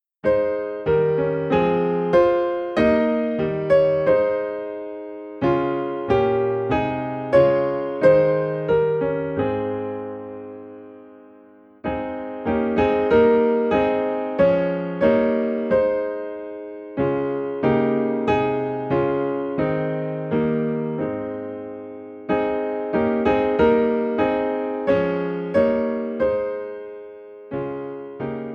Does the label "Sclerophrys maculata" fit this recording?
No